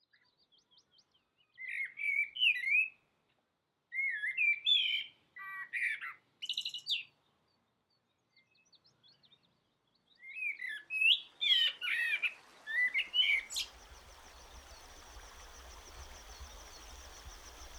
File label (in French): quai mont blanc; la spatialite du son geneve enquete dharmonie sonore; lauret